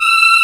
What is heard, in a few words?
CHOIR B3; AKAI S-Series CD-ROM Sound Library VOL-2